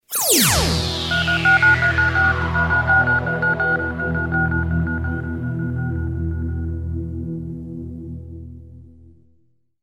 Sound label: Spacephone